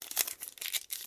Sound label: Masking Tape on Fingers; Office Supplies; 1001 Sound Effects